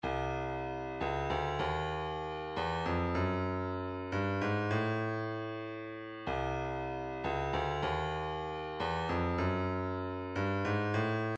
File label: kick, midi; 154 Back Talk